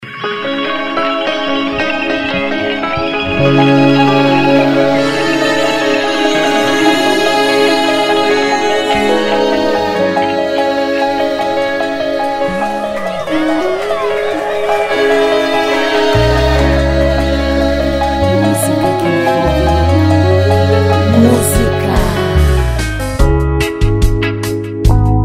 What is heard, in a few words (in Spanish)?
musica